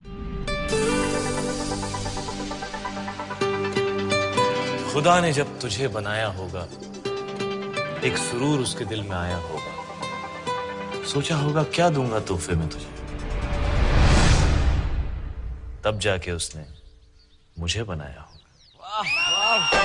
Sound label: bollywood ringtone